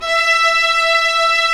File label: String Vlas Sect Agitato; String Master Series; Vlas Sect1-2